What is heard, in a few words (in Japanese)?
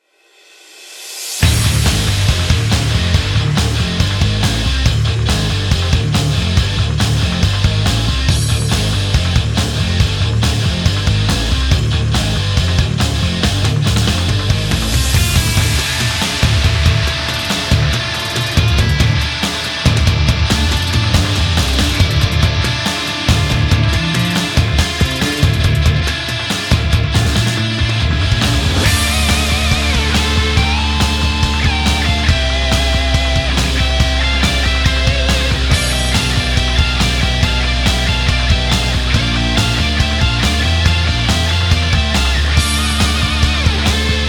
ロック BGM